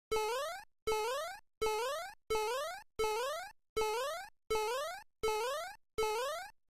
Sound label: jump sound